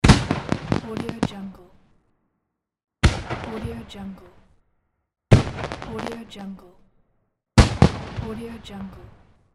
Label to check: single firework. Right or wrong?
Right